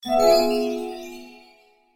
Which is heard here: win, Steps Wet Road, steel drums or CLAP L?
win